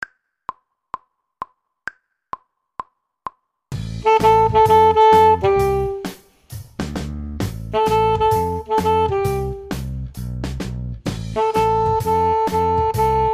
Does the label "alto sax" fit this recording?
Yes